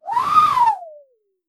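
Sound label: funny cartoon ghost swoosh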